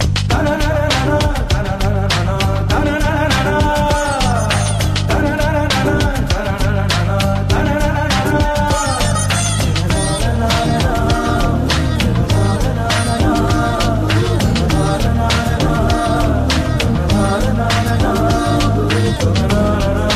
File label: humming; classical